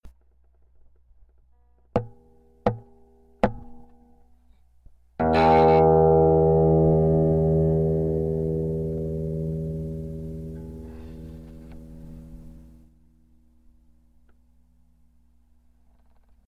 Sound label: MAG PIEZO